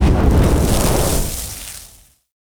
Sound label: Fire Engulf, Exterior; Warframe; Awakening Remaster; Ambience